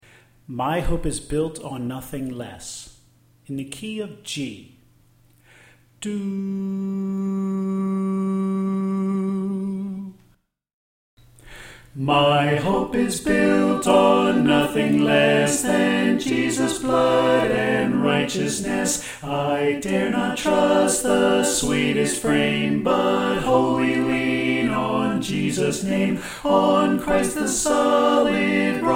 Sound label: Bass Left